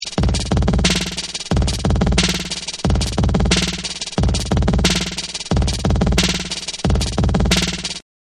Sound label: Breakbeat